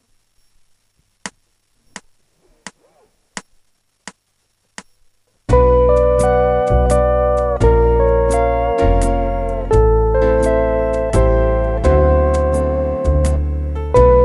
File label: waltz